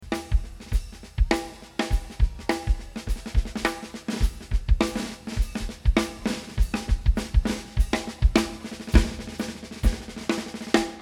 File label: Drums